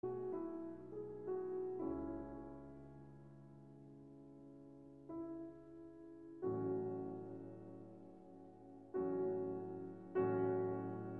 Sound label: Piano Concerto in C Minor, Largo